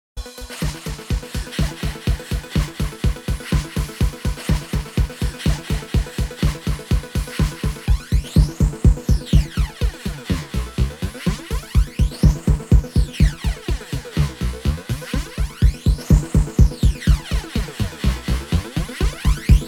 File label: Flange Default